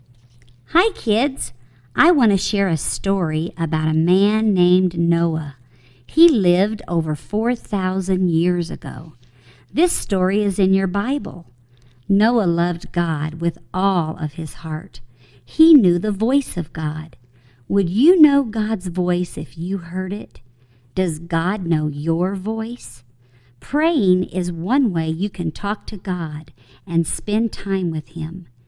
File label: Singing